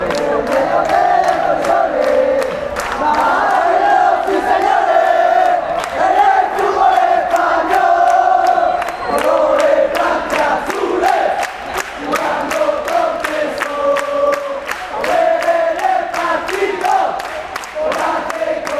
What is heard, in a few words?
himno 2a mitad fan chants